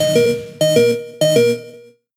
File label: Health Low; In-Battle; Pokemon; gen 6 attack moves; XY ORAS